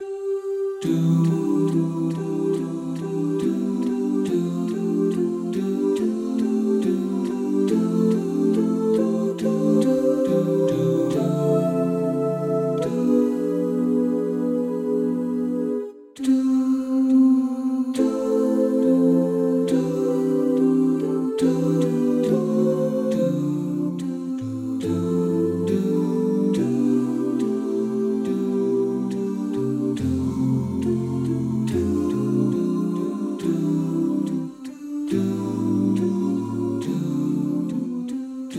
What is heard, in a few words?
Bas